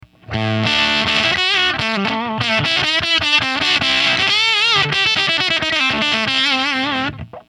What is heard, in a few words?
Two Input Bright Before